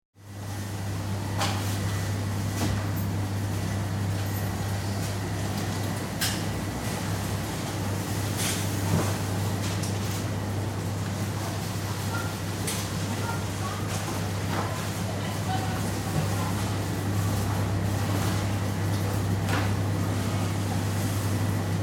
Supermarket, Sound of shoppers browsing in supermarket store.; Stores and Malls; Backgrounds